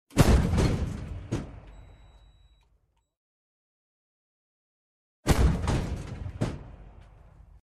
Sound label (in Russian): zvuki vistrela orudiya vnutri tanka